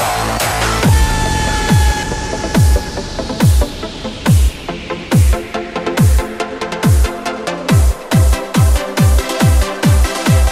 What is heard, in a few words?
Vivo tune